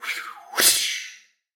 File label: whip